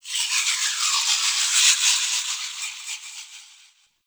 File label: Gas City distant textures; Warframe; Corpus Gas City; Ambience